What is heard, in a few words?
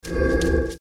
run; qianxing